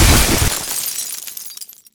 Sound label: rock debris crash; glass debris crash